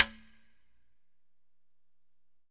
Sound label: Congas wet, drums percussion